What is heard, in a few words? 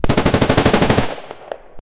burst fire m16 gunfire